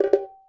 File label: battery countdown